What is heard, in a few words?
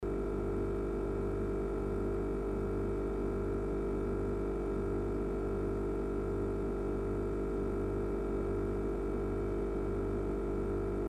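sound of refrigerator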